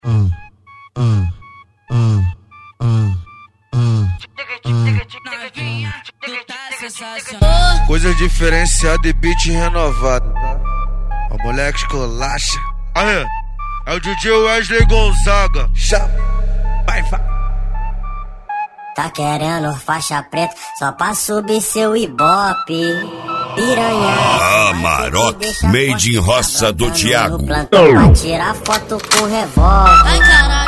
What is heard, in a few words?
Funk